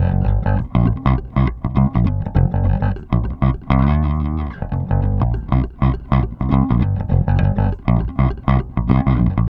Bass, RnB

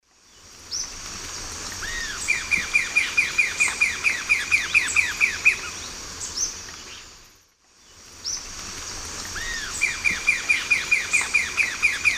Sirystes sibilator, long song; S. America; Eastern Lowlands

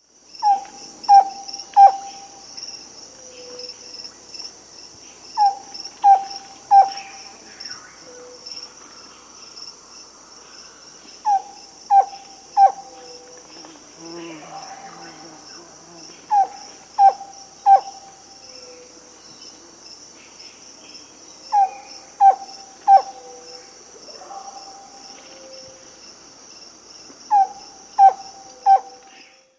White-Lored Antpitta; S. America

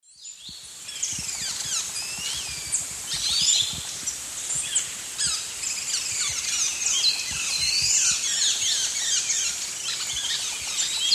Pachyramphus minor, calls and song; S. America; Eastern Lowlands

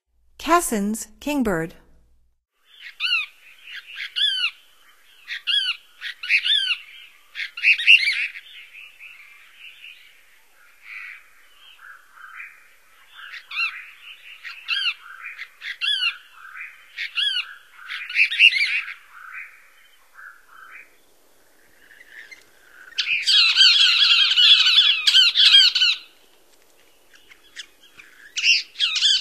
Cassins Kingbird, Songs of Birds of North America; Stokes

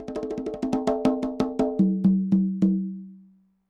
conga licks, latin hits n licks

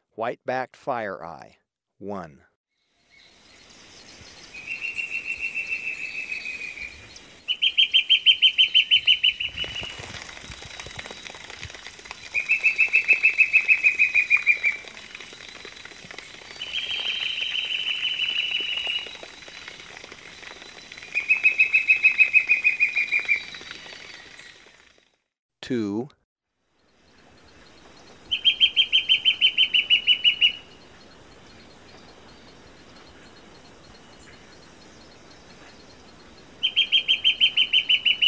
White-Backed Fire-Eye; S. America

